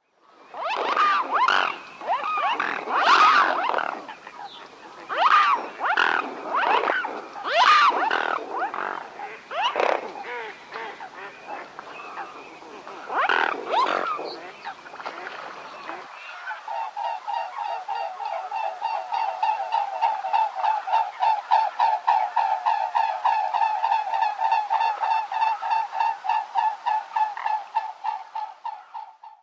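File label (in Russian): lebed shipun golos, mute swan voice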